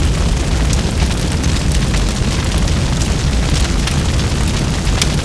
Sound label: fire; Mafie 1